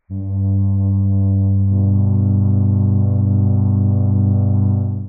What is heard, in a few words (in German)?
flöte g h; Intervalle, die man durch Potenzen von 2 und 3 darstellen kann